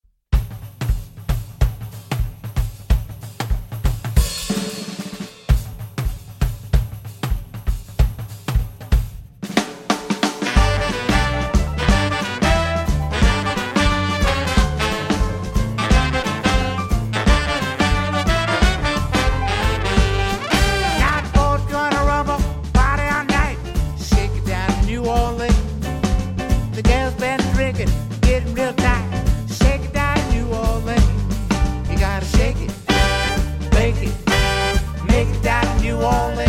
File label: Blues